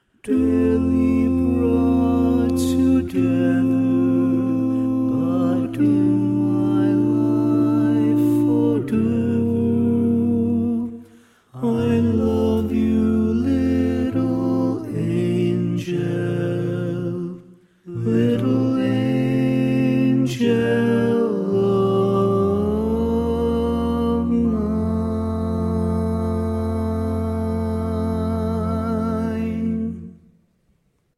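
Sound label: Baritone, barbershop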